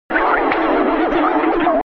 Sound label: radio starting